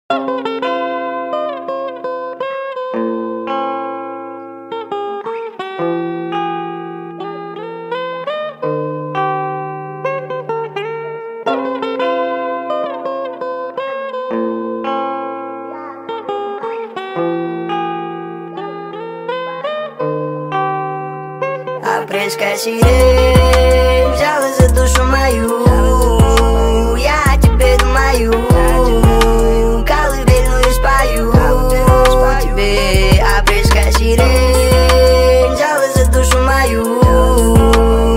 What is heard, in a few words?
sped up